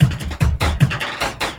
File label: LOOP, Drum & Bass, 150 BPM